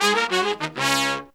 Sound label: Phantom Horns, 96BPM; 90 s Sample CDs; Zero-G